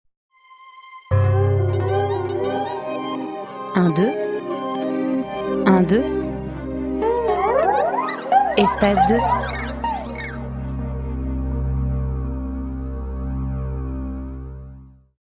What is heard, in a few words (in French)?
cordes; Espace 2